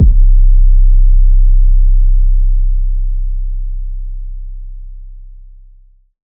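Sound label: 808 with kick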